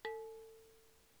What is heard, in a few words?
Tapping